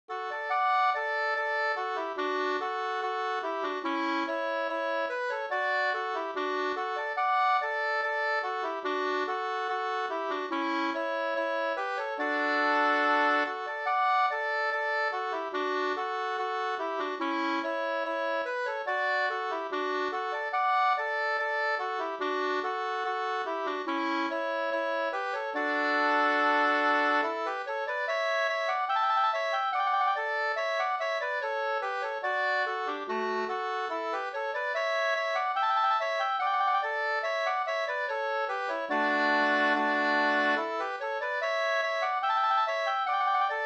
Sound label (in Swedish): skanklat